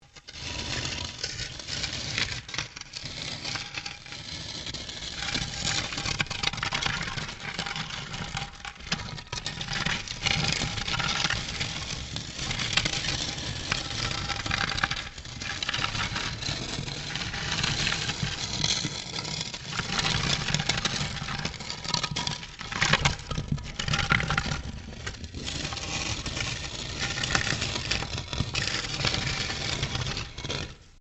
Stone On Stone Large Scrape

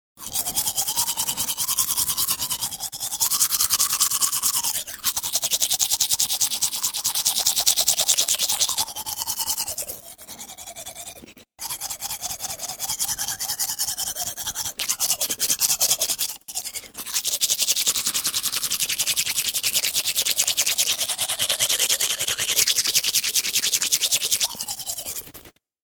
Vigorous teeth brushing

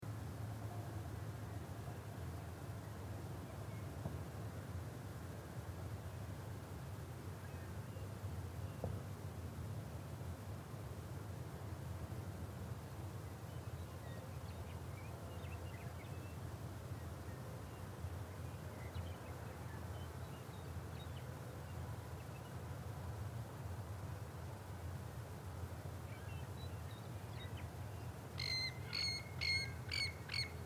Desert Utah, Desert Ambience, Daytime; Some Birds In B / G , Insects , Single Bird C / U; Outdoor; Outdoors

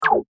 Laser Pistol; laser gun; Laser Gun Sound Effects; science fiction sound effect; star wars / trek style games

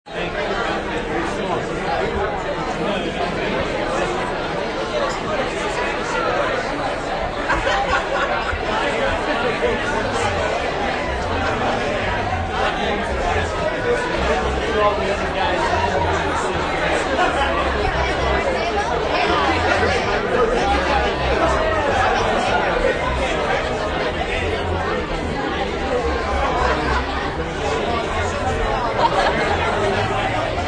Reception, Banquets And Reception; Excellent high quality stereo recording right smack in the middle of an upbeat reception with multiple conversations and laug

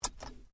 Seatbelt Clip Open, car; Hyundai Accent 2008; Hyundai hire car; Vehicles

